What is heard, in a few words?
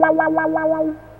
WAWAWAWAAA, Funky Gtr Licks; E-MU Formula 4000 Series Vol. 1; Hip Hop Nation